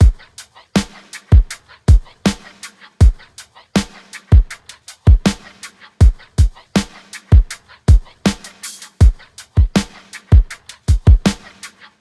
ui debug music 80bpm; Just Dance 2021

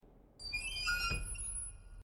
door metal open

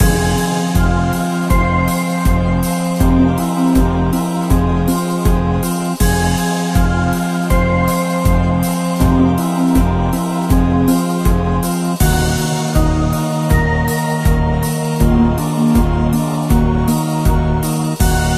Dungeon; asdivine dios

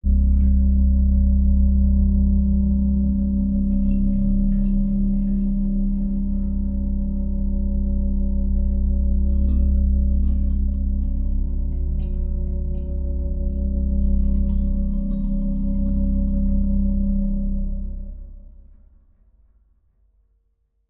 Death loop; roguebook

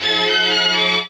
Beat

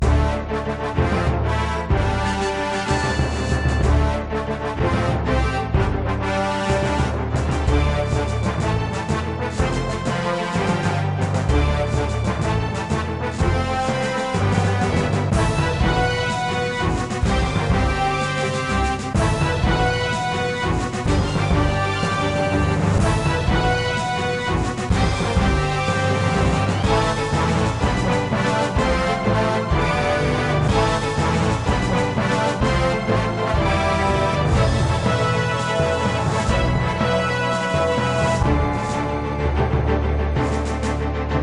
music; WMS Medieval Madness

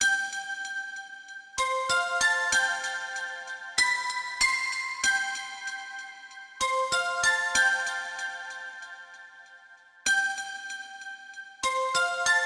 High bells; Vostok Inc.

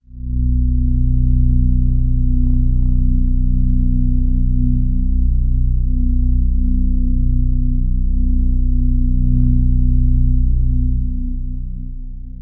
Overlay Drone; Act1 Anchor

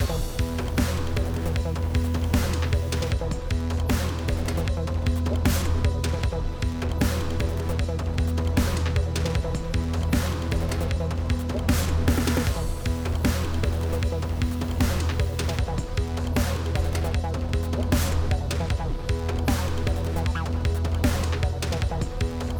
Combat 8CH 77BPM

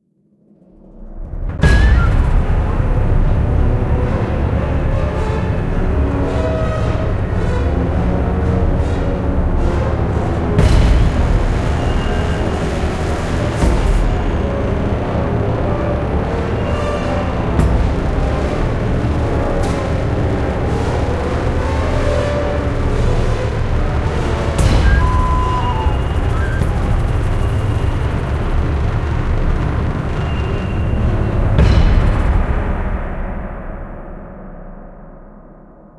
Chaotic Suspense; Jurassic Park The Game

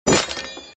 Sfx Enemy Knight Dead

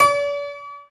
Beat

